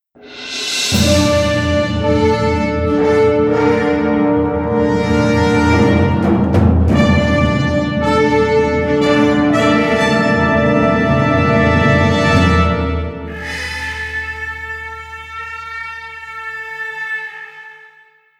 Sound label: Enemy In Range Rev, Cymbal